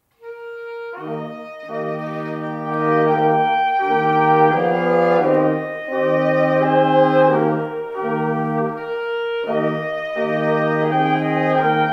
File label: instrumental; AmazingGraceMedley; calvaryministries